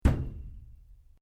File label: PLASTIC PIPE LARGE STRIKE METAL RING